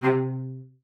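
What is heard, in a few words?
C3, cello spiccato; Xsample VOL-5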